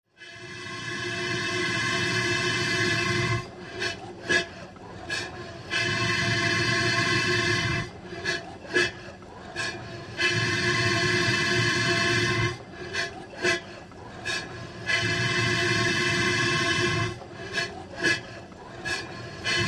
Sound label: Vacuum Pump W Hollow S; air